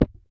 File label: dig oddly breakable by hand